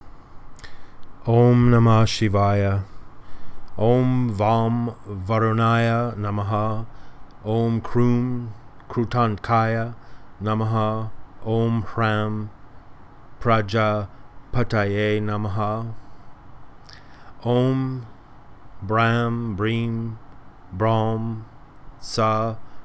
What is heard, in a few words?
Mantra; Formula